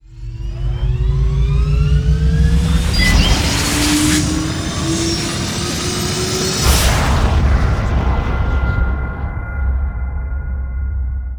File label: ship assend; ships misc